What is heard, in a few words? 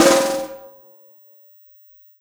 Snare Shard